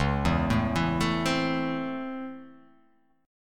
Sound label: Db Minor Major 7th Sharp 5th Db; DbmM7#5 arpeggiated; JGuitar